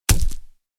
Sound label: Fire Weapons Sound Effects bullet hit body